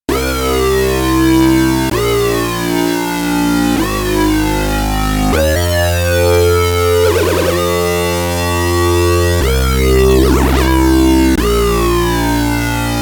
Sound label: Super Sync, sequential